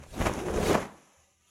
wizard apparation sound effect; soundscrate

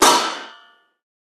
bullet impact metal

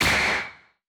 anime hit

